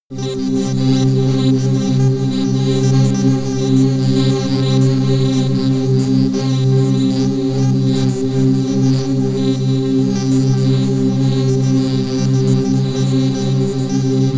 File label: drone fly convention